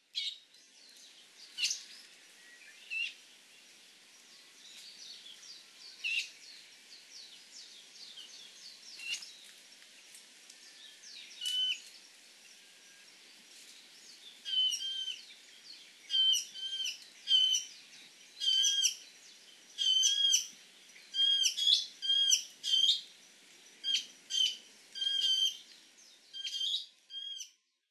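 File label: Bonin White-eye; meguro; hahajima; koe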